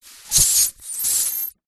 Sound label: balloon air release valve hiss; foley